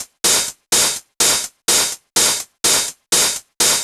drum switch hat 125, Drum Loops, Classic Chicago House